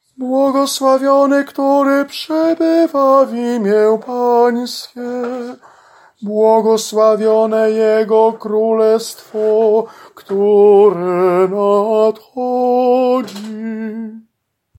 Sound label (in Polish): SOPRAN; Aklamacja Niedziela ChrKr